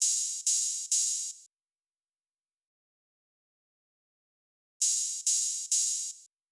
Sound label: Drum Loop, 100 BPM Open Hat, West Coast; Loop Stems; Cymatics; GHOST (Beta Pack); Flow Drum Loop